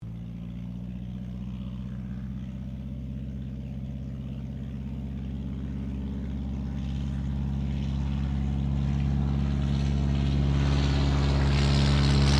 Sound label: AIRCRAFT DH82A DEHAVILAND TIGERMOTH TAKEOFF; RAAF Tigermoth; Airplanes